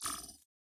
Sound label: lobby button map hover; strawberry